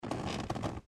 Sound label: Chair Leather Sit Down Couch Foley; Office; Blastwave